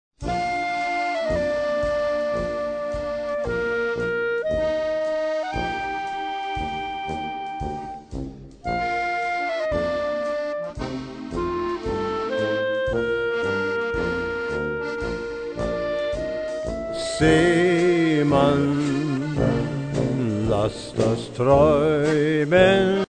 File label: swing